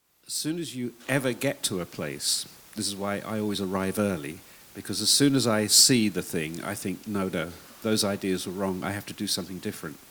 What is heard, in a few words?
wrong peace of music reverb